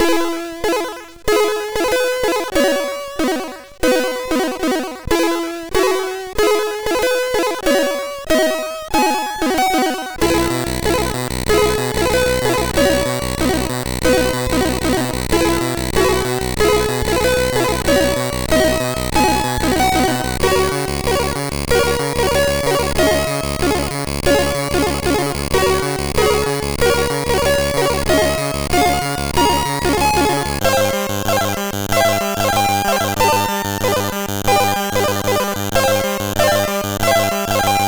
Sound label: A Very Quick Tune, MOS6581R3